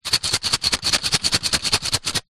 padded envelope containing small plastic items shake; zapsplat